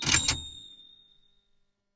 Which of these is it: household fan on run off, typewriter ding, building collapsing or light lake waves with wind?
typewriter ding